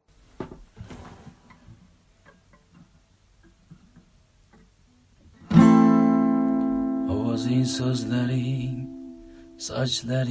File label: guitar live version